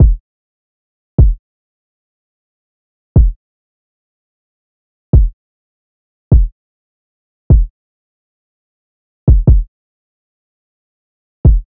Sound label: Drum Loop, 152 BPM Kick; Cymatics; Vibes II Drum Loop Collection; For You Drum Loop